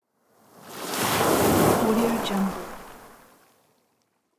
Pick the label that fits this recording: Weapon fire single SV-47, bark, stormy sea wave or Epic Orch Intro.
stormy sea wave